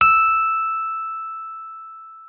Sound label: MKFF E6, RHODES; RHODES A; Xsample VOL-1